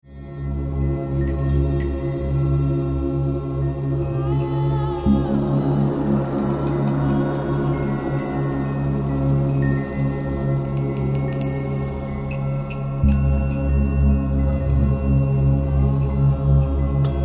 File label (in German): Entspannungsmusik